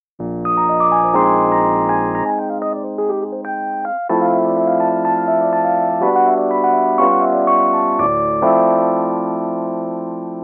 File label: Beaten Rhodes; PC3